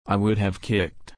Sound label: kick